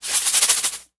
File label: Salt Shaker, cooking